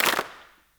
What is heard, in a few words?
GROUP CLAP, HANDCLAPS; Spectrasonics; Heart of Africa